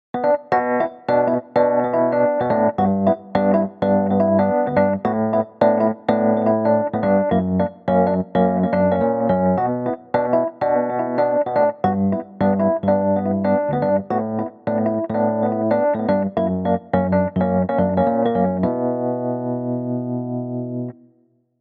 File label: Electric